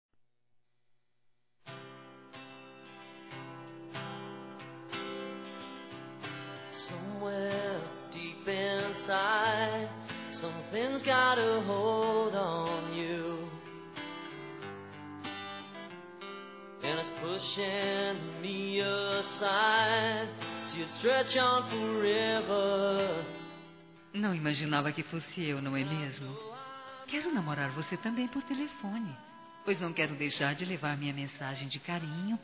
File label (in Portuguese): paquera por telefone f; Conquistas Cantadas paqueras; fem; Telemensagens; Telemensagens 2017